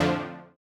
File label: Housey Stab F2; KG MegaSaur